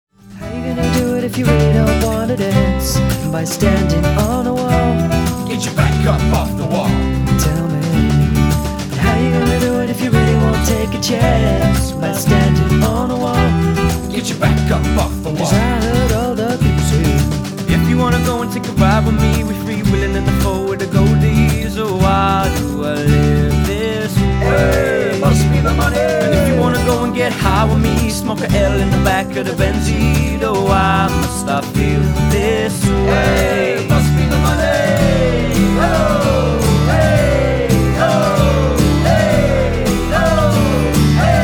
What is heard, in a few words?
Funk Hip hop Mashup